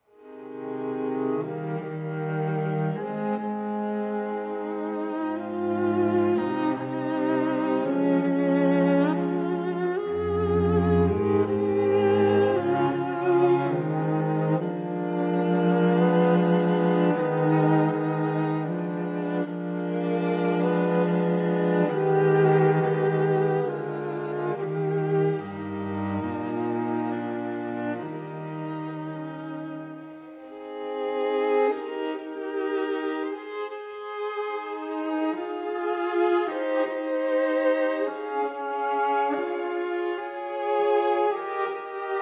string quartet in c minor romanze poco adagio

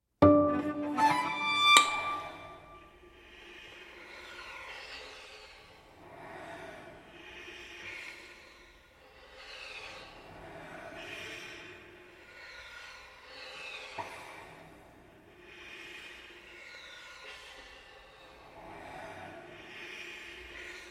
string quartet

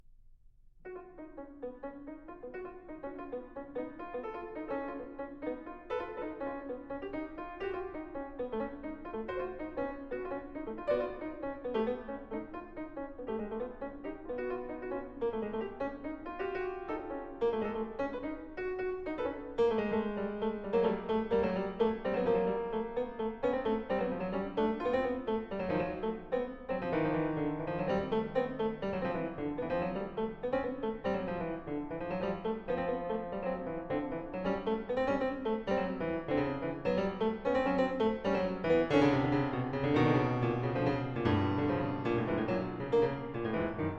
etudes pour piano

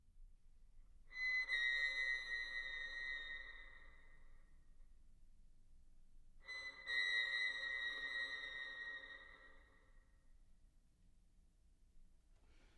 violin concerto

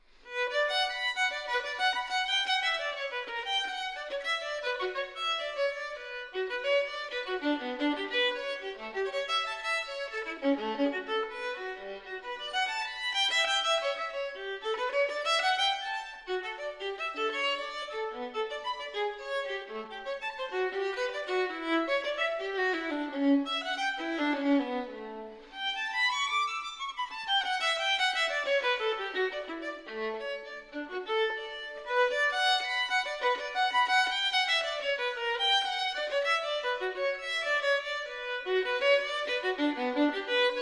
violin partita in b minor double; violin partita no 1; bwv 1002

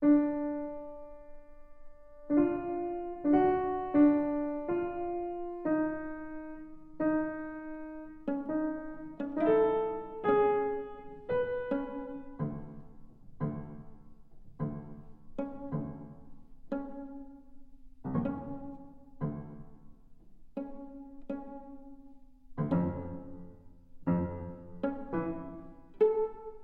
sonata for viola and piano

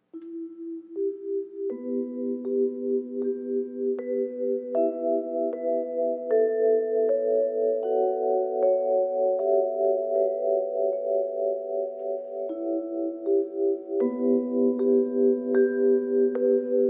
languid dreamy; continuous interior ii; Horizon Line

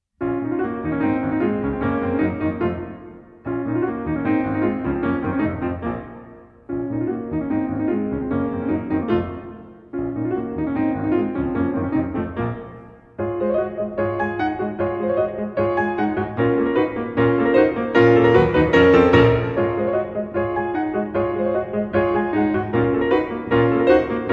string quartet in b flat major presto

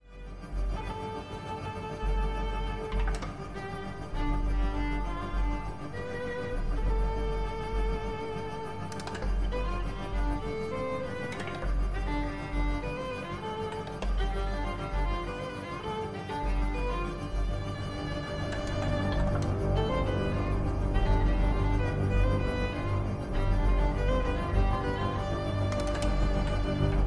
violin; violin power

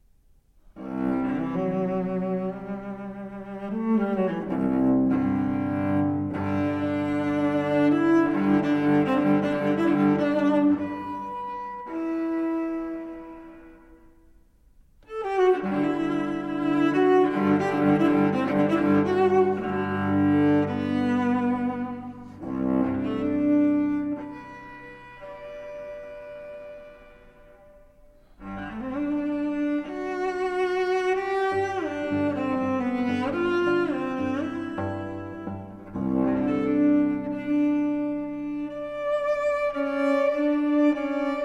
suite for cello

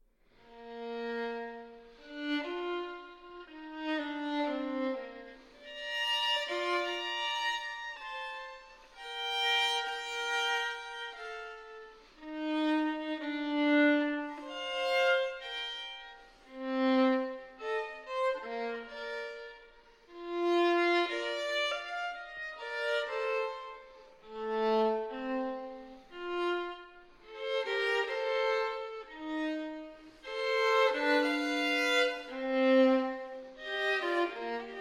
violin sonata in g minor siciliana; violin sonata no. 1; bwv 1001 iii

